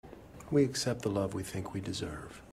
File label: ringtone